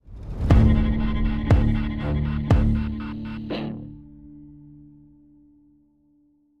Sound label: Tension Stop 120bpm 3bar; project zomboid